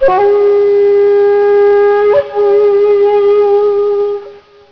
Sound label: flute